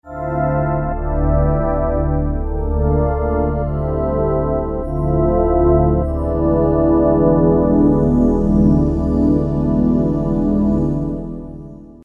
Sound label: California Pad